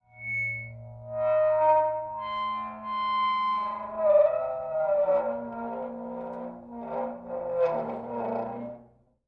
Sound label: Rusty Wheel